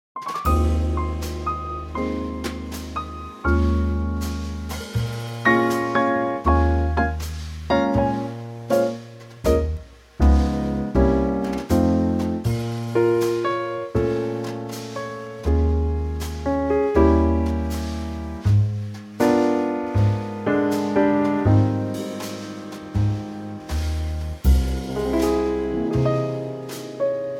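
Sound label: Ab, backing track; Trio; key - Ab - vocal range - B to Eb; Gorgeous Trio arrangement of this wonderful standard.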